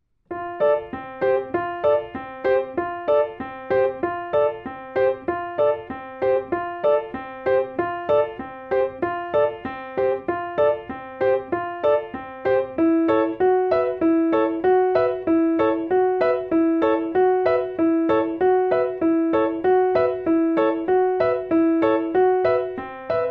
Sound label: Shells piano